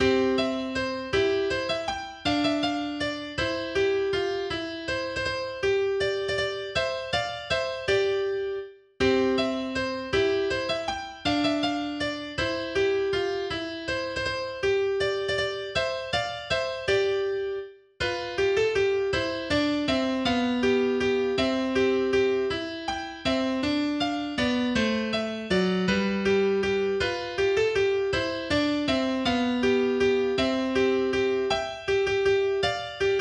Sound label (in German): Walzer, mehrstimmige Gitarrenstücke; Ferrer